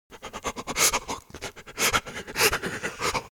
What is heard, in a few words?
Creature sobbing in fear